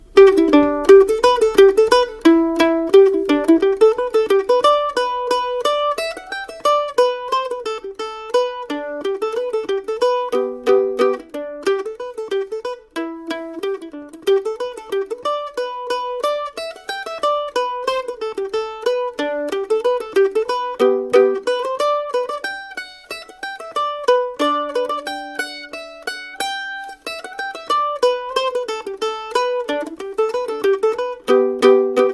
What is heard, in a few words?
mandolin; farewell to whiskey